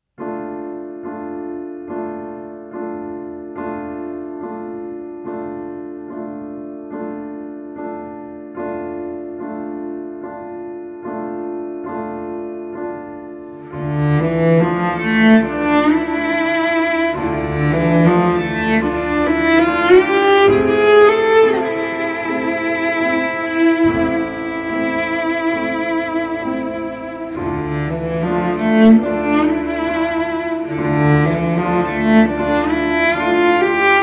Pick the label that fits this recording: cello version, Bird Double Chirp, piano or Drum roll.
cello version